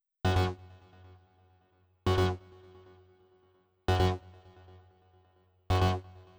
132 Beep; Zero-G Trance Inducer; Construction Kits; 132bpm

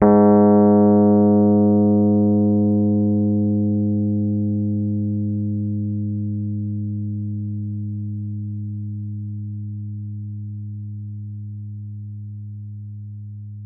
G#2, Rhodes Mark II; mkII 88 key HI; CEPCo Sample Series Vol. 3

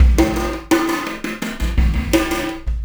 Beat, Processed Drumkits; Event Horizon

